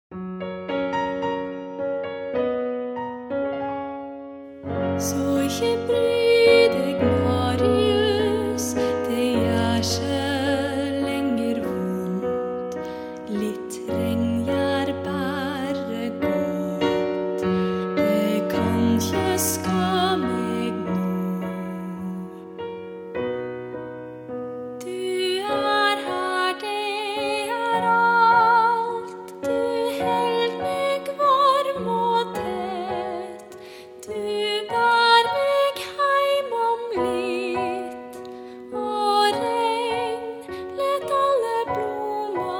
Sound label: piano; eponine